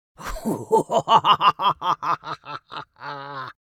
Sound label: Witch fantasy vocalization laughing cackle evil